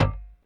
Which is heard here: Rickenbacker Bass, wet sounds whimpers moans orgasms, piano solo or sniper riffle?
Rickenbacker Bass